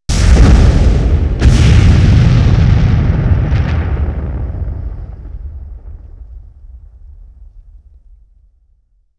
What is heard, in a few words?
Explosion; Sony Pictures Sound Effects Series; Explosions - 5.1 Surround